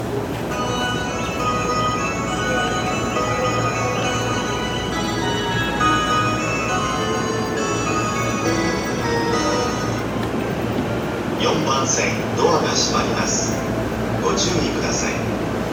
JR中央線御茶ノ水東, train sounds

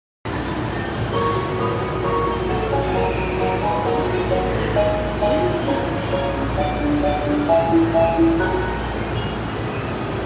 JR 常磐線 上野 北, train sounds

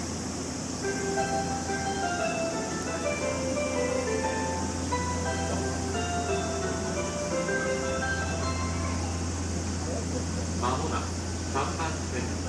須磨東予告, train sounds; 山電

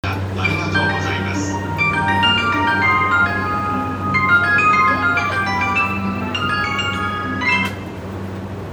JR 山手線 新大久保 CW, train sounds

